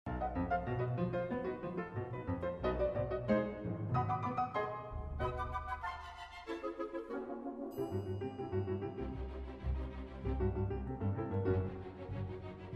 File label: Piano Con